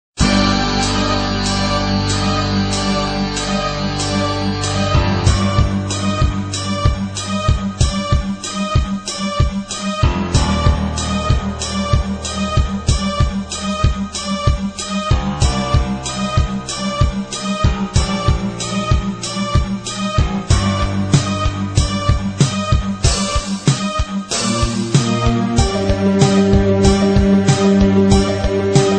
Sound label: Deaths Bells